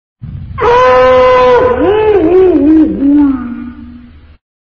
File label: yell; goofy